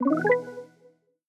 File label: Speed Spin; Peloton